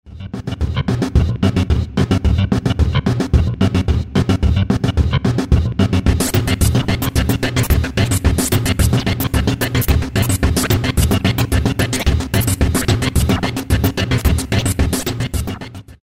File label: beats